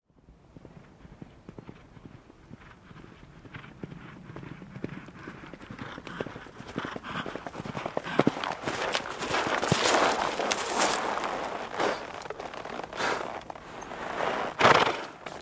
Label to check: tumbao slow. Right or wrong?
Wrong